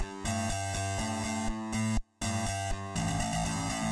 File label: Guitar Chorus